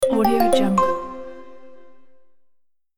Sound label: insert device